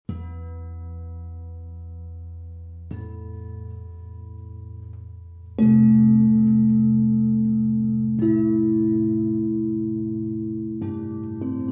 gongs

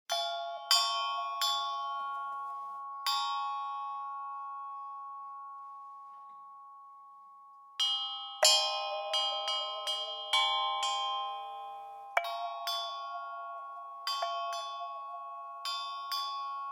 fork chimes

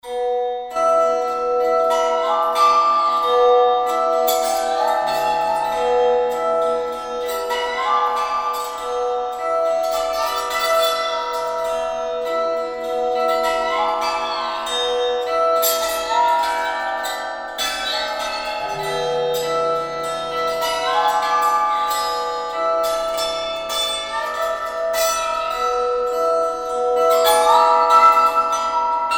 Slide Ice

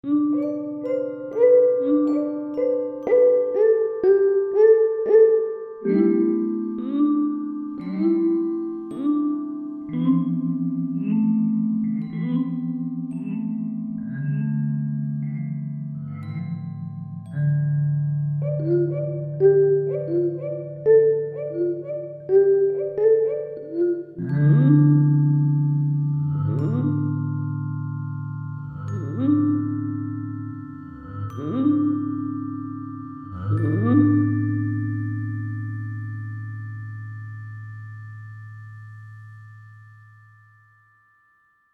Squeegy

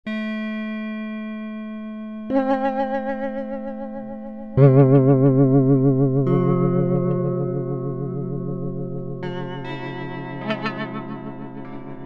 big weights

